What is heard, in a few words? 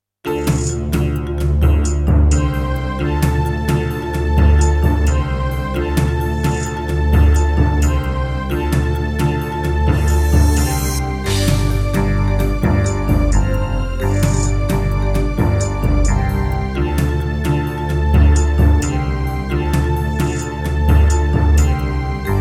Yamaha DTXpress, wavetable; Heretic